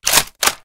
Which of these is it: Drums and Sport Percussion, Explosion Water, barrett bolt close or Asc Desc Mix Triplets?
barrett bolt close